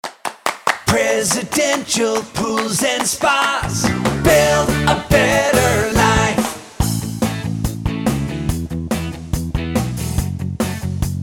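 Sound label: spa sales and service jingles, acapella jingles; Presidential Pools And Spas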